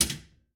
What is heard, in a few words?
Pinball Bumper